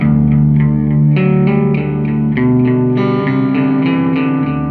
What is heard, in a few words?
loop 1bar